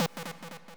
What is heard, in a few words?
sub lead e2